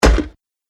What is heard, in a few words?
Club Crunches Plate Mail Armor; Staff And Rod Sound Effects